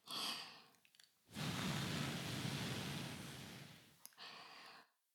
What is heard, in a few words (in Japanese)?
耳舐め音声 (LR逆); 古き城のドラゴニート2